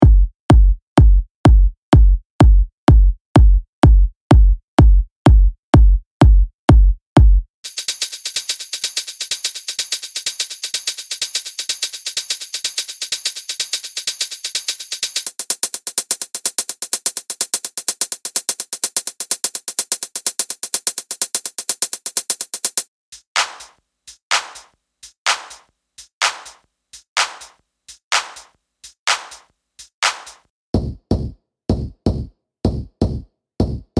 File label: ultimate techno